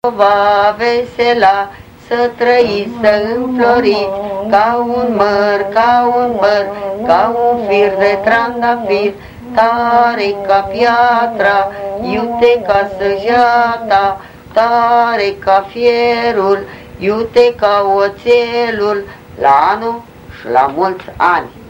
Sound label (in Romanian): Sorcova, cantec popular vasluian; Centrul Judetean pentru Conservarea si Promovarea Culturii Traditionale Vaslui